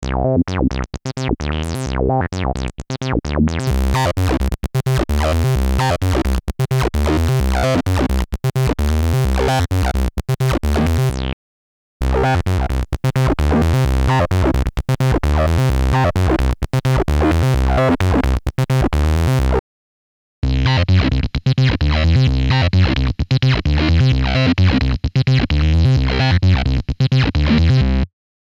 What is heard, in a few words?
Korg EA-1 Distortion Internal Mackie VLZ Ableton Amp